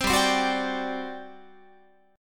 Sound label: G Major 7th Suspended 4th, Guitar; GM7sus4; strummed